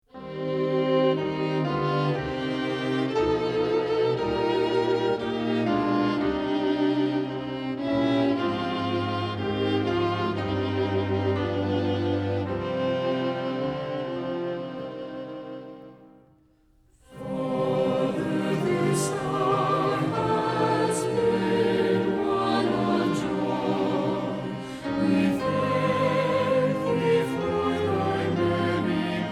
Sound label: vocal and instrumental, hymns